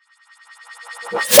FX Snare R, Reverse

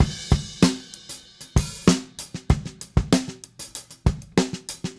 Drums Crash, 70s Analog Funk; Sonic Foundry (Sony Creative Software); What it Is!

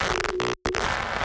Glitches; Antidote Advent

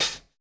cymbal, Roland MC-909

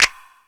Crash, Drum Machines E-MU Pro-Cussion

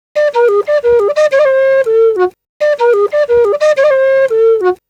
Penny 98 bpm G, Penny Whistle, African; Quazibeat; African Secret

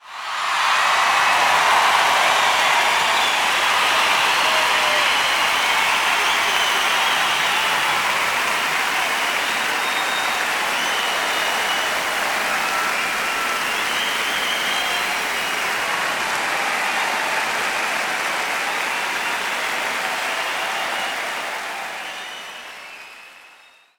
Crowd Effects; Vengeance - Essential Clubsounds Vol.4; 140BPM

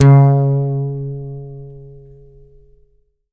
SUSFC#3, Bass, YAMAHA5 MUTE

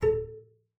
BASS PIZZ A4; Xsample VOL-5

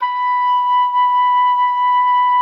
OBOE1, B5; Xsample VOL-6

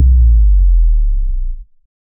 D+B

